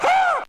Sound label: scream woo; ooh ahh